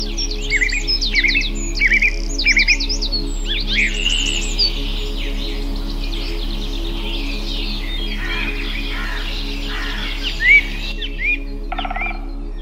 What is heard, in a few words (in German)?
Wald